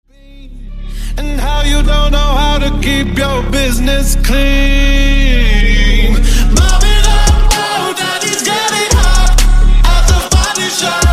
Tremor Bounce 128Bpm

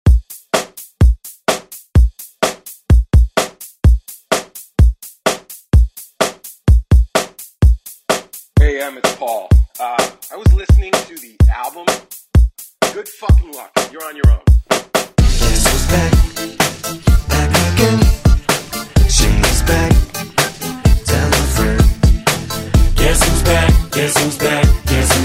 Re Drum

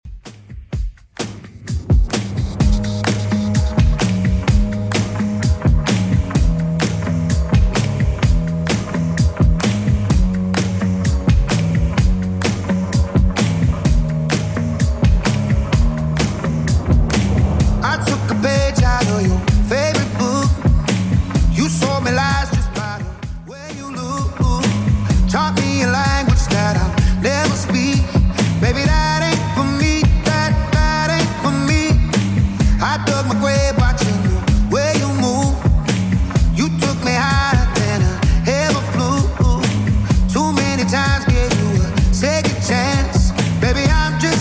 Top40 Redrum 128bpm CLEAN

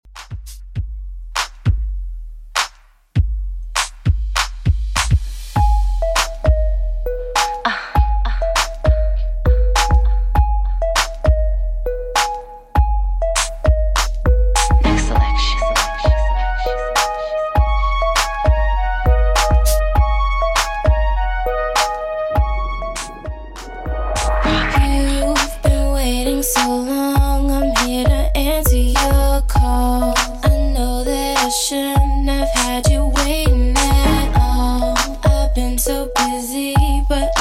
2ks Redrum 100bpm

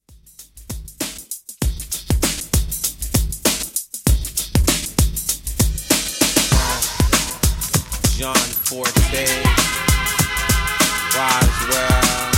90s old school redrum 98 bpm

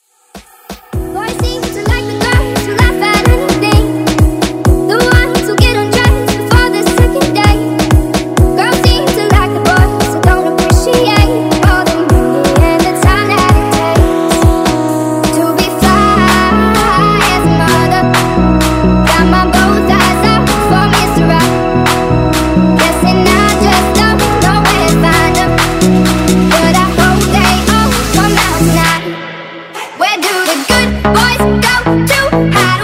Dance Redrum

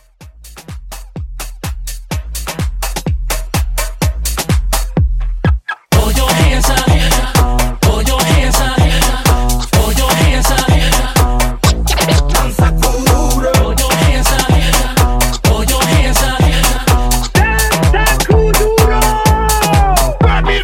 Hype Kuduro Re Drum

